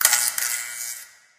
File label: light flicker; Source Engine; Portal 2